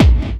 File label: KIT HOU; Best Service